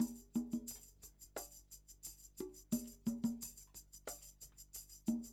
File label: con tam, Percussion; Big Fish Audio - DOM